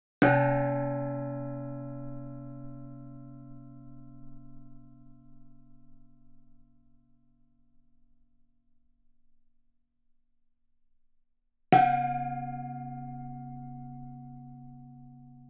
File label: Gamelan; Propeller Island - Complete Gamelan